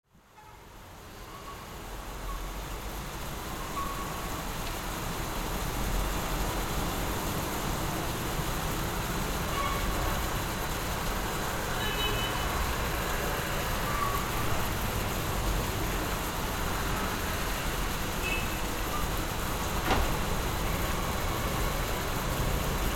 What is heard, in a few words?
cyclone rain; Glitterbug